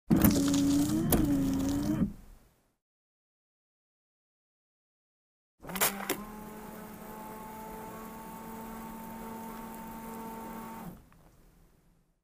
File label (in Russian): Avto dvorniki zvuk podachi vody